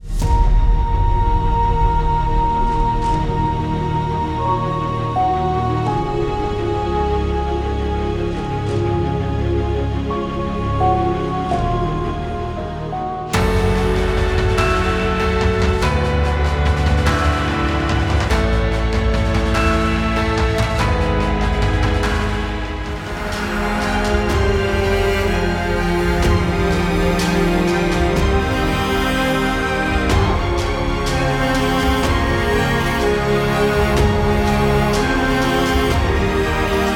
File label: Emotive Cinematic Sampler